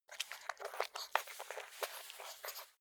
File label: pug smack; natur-tiere; hunde